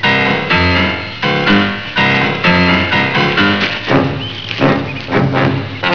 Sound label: SUSPENS, MUSICAS